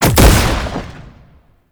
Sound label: Brood Impact; Warframe; Oraxia